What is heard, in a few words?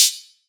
Open Hat; Syba